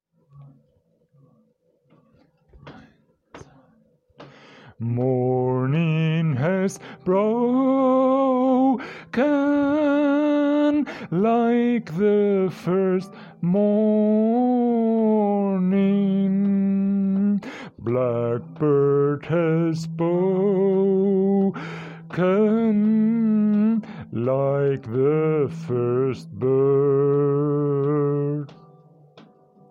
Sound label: bunessan vocal; Morning Has Broken (Bunessan) - vocal